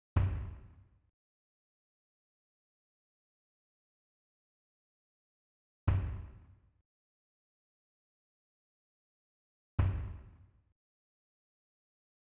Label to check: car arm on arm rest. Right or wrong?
Wrong